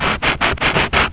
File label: chalkboard